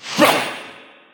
Samurai parry; Fight Knight

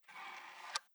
twitter open

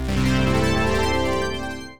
Menu Splash; Dreamer Series Pop Star; 2009 nds gamerip

